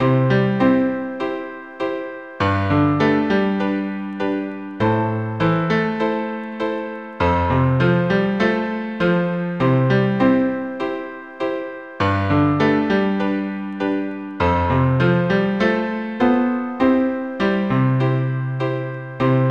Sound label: Pop-Rock Etude